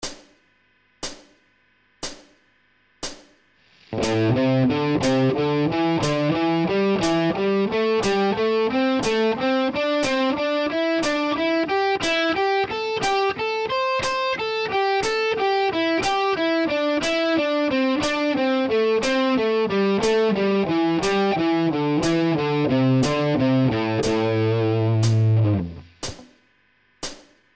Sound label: pentatonic triplet 60bpm, guitar; triplet exercise